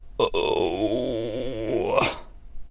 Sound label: Groan